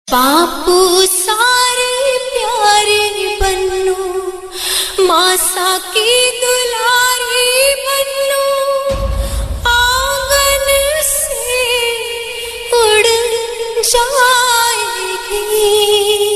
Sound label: Sad Song; Zee TV; Jodha Akbar; By Jodha Mother